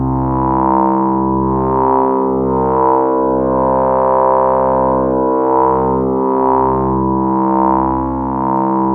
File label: tri ring mod, pads; Zero-G Ambient 1